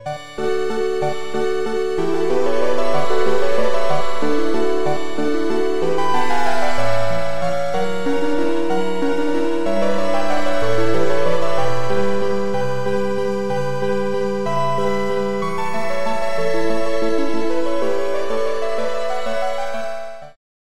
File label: gloomy keys